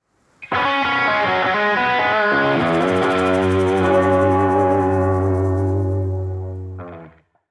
inspect music; cstrike; valorant; neofrontier sheriff

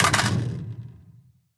mag drop, weapons; cstrike; valorant; reaver vandal

